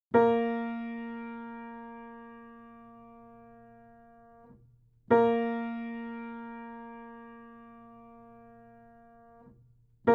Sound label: Tuning Notes Bb